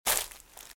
Footsteps - Leaves - Walk - Left; RadwinIsland

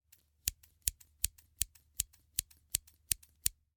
Scissors, snap, snip, shear, medium, 9 snips; Eiravaein Works; Vaeyan IV

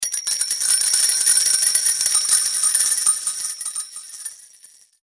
coins long; Dice World

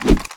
Swish Weapon Swing Swipe; SFX Kit

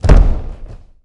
Boxing Ring Bodyfall

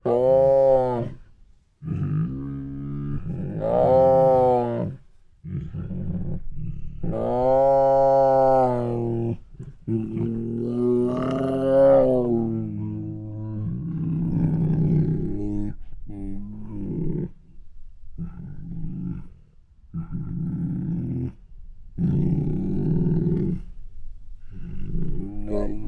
Two Tigers Make Many Hungry and Sad Moans; Sound Ideas

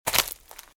Footsteps - Leaves - Walk - Right; RadwinIsland

